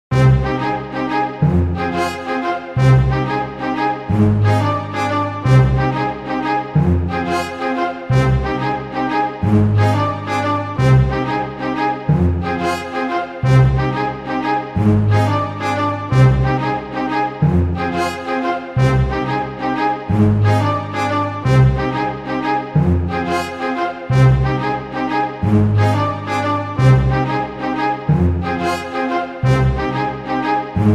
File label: radio jingle